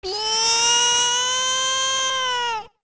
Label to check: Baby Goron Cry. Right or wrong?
Right